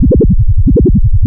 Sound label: ARP2600, modular syn